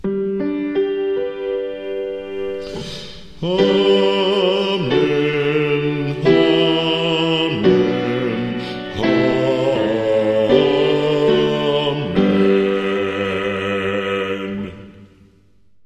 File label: 3 fold Amen Bass, choir